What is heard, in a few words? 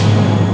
string; bom string; Amiga Soundtracker Sample Packs